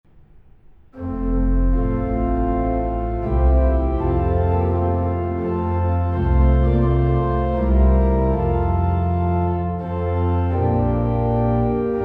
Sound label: organ; hymns